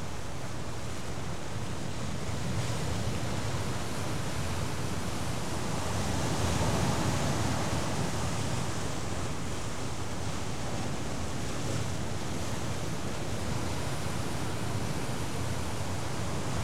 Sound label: Sea Wave, ambience